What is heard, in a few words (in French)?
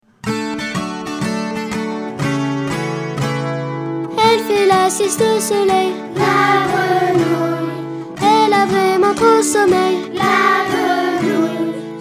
Chanson La grenouille